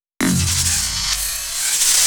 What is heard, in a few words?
Fill 128 BPM; Vengeance Samples; VEH1 Loops